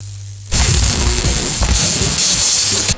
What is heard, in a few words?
continuous electrical buzzing and zapping; electricity